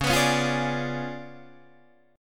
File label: C sharp 7th Flat 9th Guitar Open D, chord